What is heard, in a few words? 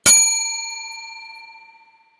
ding; Timer